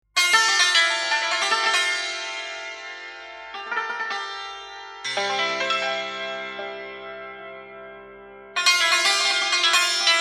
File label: Santur Solo